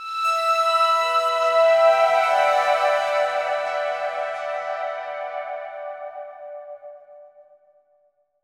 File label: Synth Emin; Torchlight III; PS4